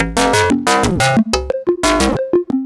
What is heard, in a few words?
FM Dirt Loop; Polyend; Stazma & Concrete Collage